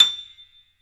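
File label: piano, Grand piano; Club-50 - Foundations Roland; YPno 1 S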